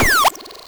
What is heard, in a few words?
laser, weapons